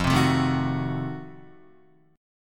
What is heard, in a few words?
F 7th Flat 9th F down Guitar; F7b9 strummed